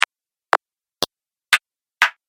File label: Analog Clicks Collection; Click Sound Effects